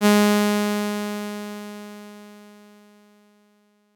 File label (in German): Saite a 415Hz, Leise Lauten; Chor 3 (aa); (Saiten 4 und 5)